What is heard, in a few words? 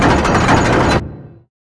machine; Quake 4 Alpha